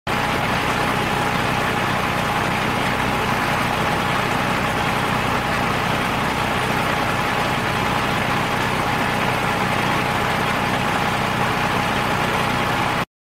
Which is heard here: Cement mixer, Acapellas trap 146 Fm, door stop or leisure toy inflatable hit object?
Cement mixer